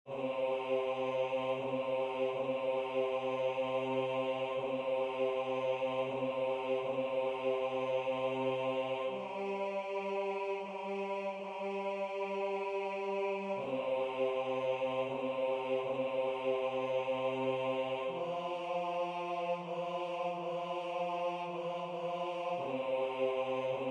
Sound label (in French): Douce Nuit Basse